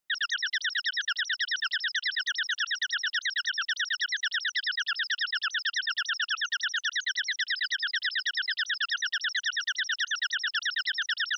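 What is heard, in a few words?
Onomatopoeia Panic Low Long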